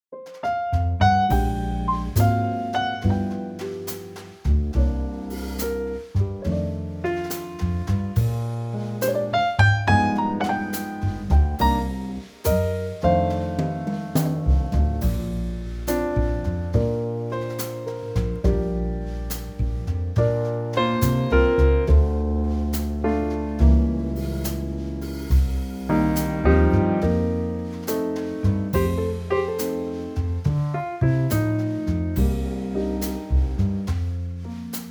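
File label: B flat trio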